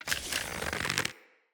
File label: Bow Charge; Weapons; Josalfar Bow